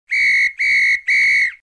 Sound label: whistle short